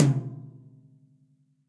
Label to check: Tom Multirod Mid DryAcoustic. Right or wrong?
Right